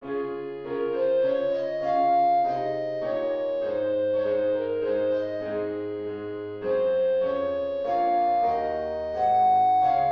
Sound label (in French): valse ra majeur; beethoven ludwig van